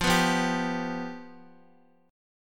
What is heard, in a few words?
F Suspended 2nd Flat 5th, Guitar; strummed; JGuitar